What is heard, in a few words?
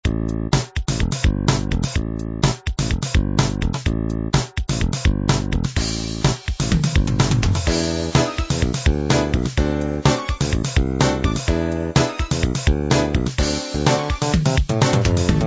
fatback